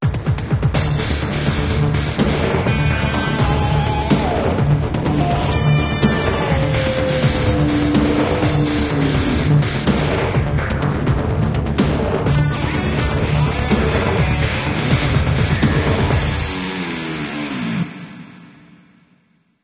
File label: Lose; Call of Mini: Brawlers; Android